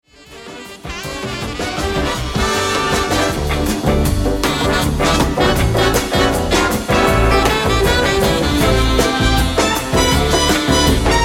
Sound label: big band